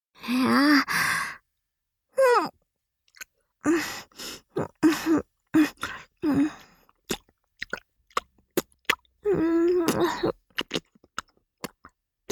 フェラチオ(中)：嫌々亀頭をしゃぶる; 実用ボイス抜粋集

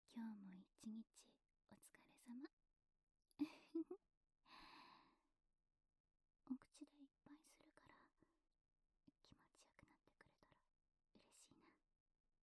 ご褒美フェラ

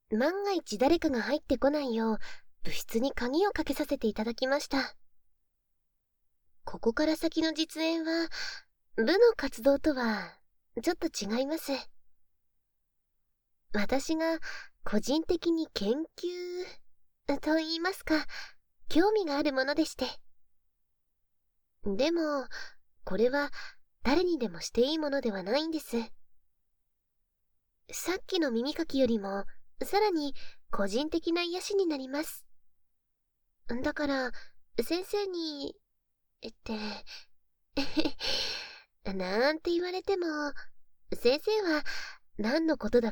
癒しの耳舐め; 効果音あり

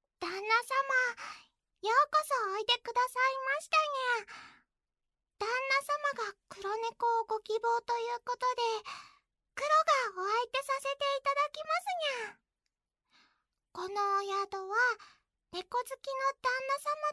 まずは耳かき にゃ

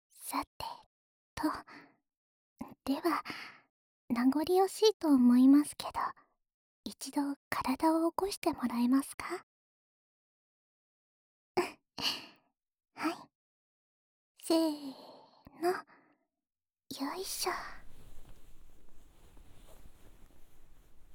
右耳の耳舐め, ASMR; お兄さん可愛いから……ぺろぺろしちゃおーっと♪

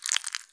深めにストローク, ピストン運動 激しめ; １ストローク単発

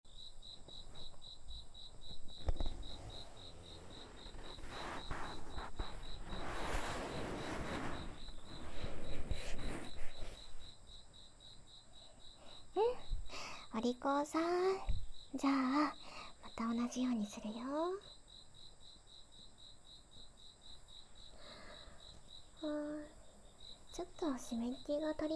右耳かき, ASMR; 田舎へいこう! -三姉妹と夏の思い出; 朝日三丁目; ともの耳かき

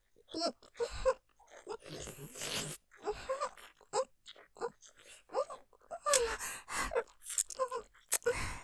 フェラ音マシマシ さらにぐっちょり吸い上げ系; ステレオ; ループで楽しむ！シェフの気まぐれ抜き抜きコース

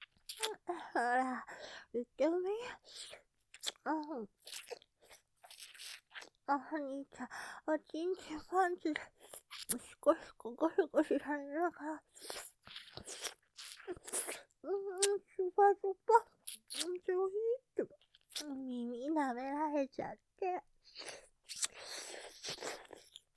舐め; ほら、いってみｗ; モノラル; ループで楽しむ！シェフの気まぐれ抜き抜きコース

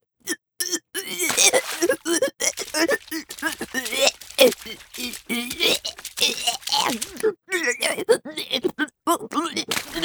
嘔吐 効果音 BGM 入り

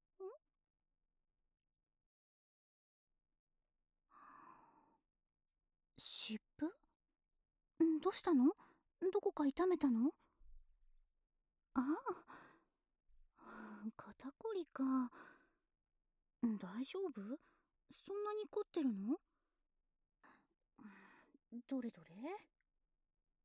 癒しのマッサージ, ASMR

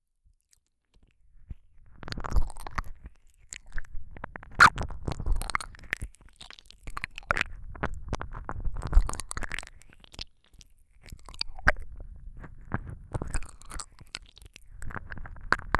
舌を感じる耳舐め

